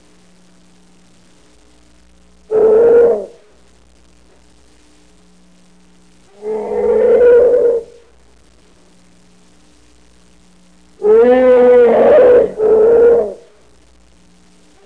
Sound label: Generic growling long